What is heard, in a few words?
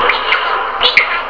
boo